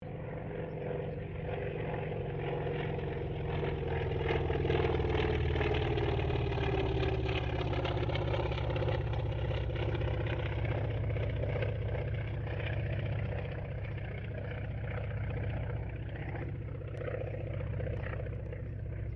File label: AIRCRAFT CAC WIRRAWAY PASS DISTANT STEREO; CA-16 Wirraway; Airplanes; Vehicles